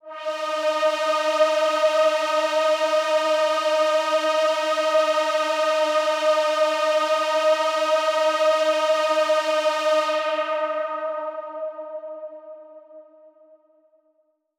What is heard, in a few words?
Big Pad D#3; KG MegaSaur